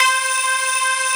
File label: SYN HP C4; Analogon